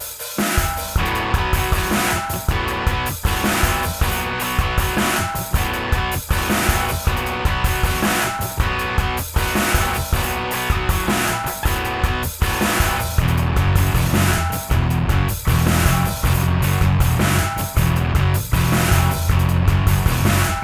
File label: solo; Stamp collection